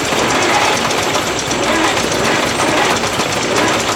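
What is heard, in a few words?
generator damage loop; Explosions; modern warfare 2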